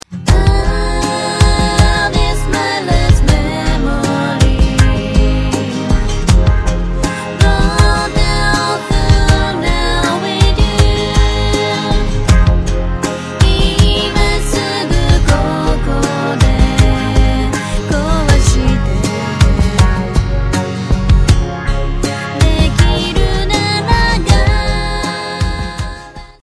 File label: android vocal